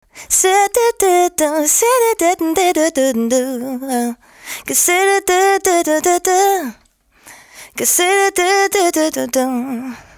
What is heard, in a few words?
vox fem dry